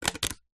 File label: foley credit card drop on table